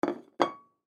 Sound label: Glass Plate Down, Glass Plate Set Down Wood Counter Top; Household; Dishware; Blastwave